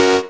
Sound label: Synths F#; Vengeance Samples